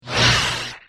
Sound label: saber hit wall; Sabres Laser